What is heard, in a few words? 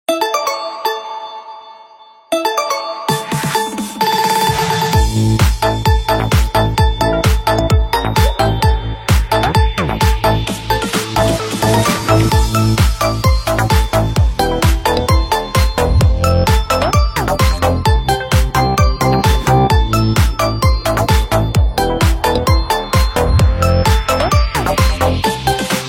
Samsung Remix Vinahouse